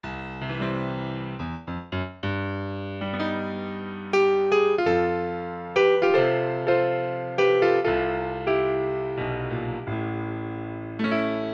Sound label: Instrumental